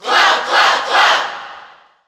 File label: Cheer English; Cloud; SSBU